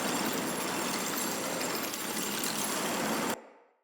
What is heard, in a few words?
Shrine Portal Warp Action Hold Start Loop